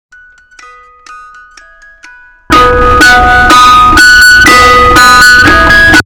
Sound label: music box; puppet